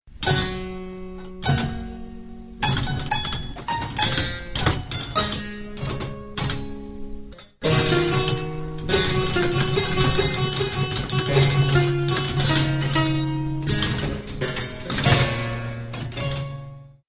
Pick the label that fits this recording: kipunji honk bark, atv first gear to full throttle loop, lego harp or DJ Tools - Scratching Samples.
lego harp